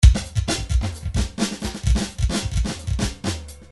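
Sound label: drums 130 pattern; Paul Kodish Dangerous Drums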